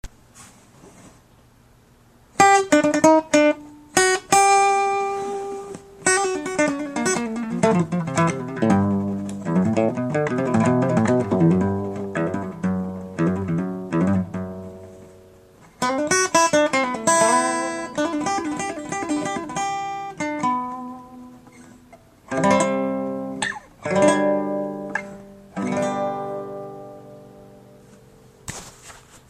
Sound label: my thoughts of you in form of guitar